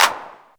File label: Clap, retro house samples, Drum Hits